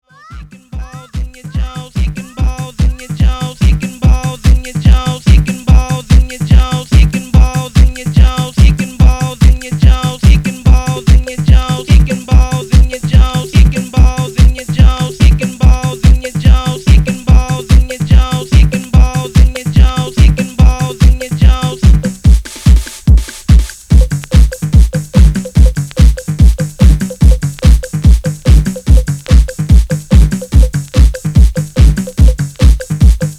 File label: Low End Madness With Drops; Low End Madness Vol. 1